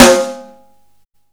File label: Snare Live, Drum and Bass; EZ Rollers; Instrument Samples